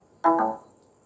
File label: windows usb out